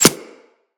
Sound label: Arrow Impact; Weapons; Bullet Impacts